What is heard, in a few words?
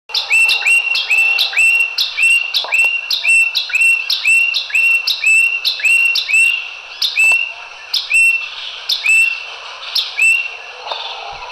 Melodious Blackbird